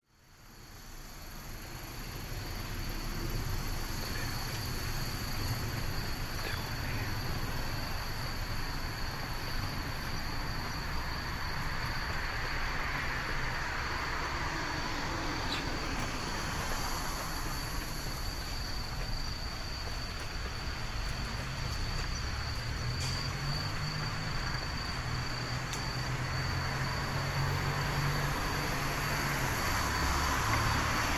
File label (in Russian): zvuki goroda